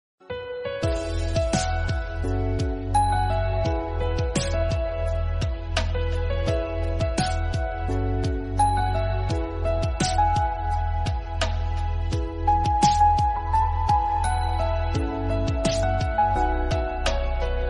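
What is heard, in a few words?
background music instrumental ringtone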